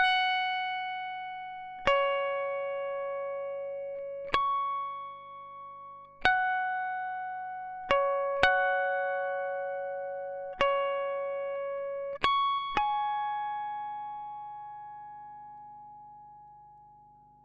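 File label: Guitar harmonics, F minor, 110bpm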